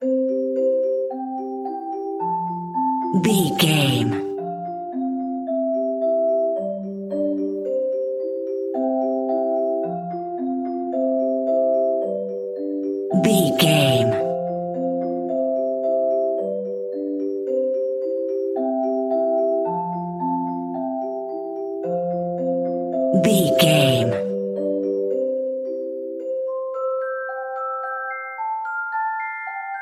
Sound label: celeste, French Celeste; Twinkle Twinkle Little Star French Celeste